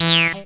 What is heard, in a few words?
Acid Sounds E, Synths; Vengeance Samples